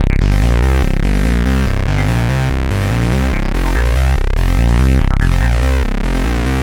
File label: Bass Loop